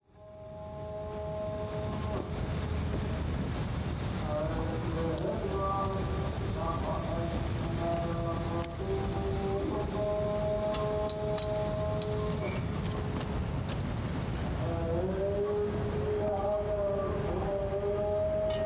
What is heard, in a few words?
Muezzin; Foum-Zguid